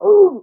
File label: Executioner Attack Vocals